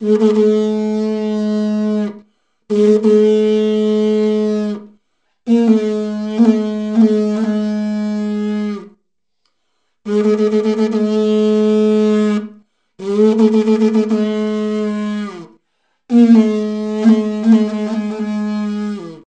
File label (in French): Trompe traversiere